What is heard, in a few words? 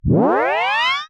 Tape Start